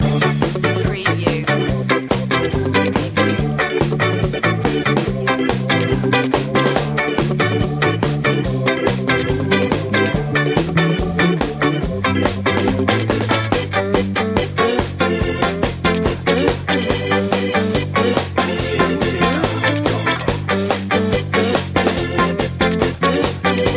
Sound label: stomp loop